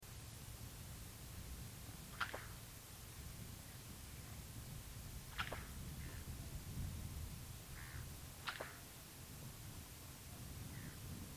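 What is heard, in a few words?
musk duck biziura lobata; lopastnaya utka; utok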